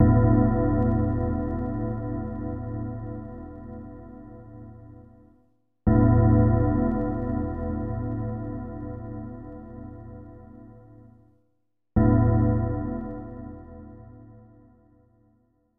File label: Bells; madara 2